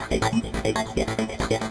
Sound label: TECHNO SYNTH 140; Keys